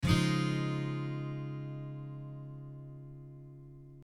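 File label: D♭add9, guitar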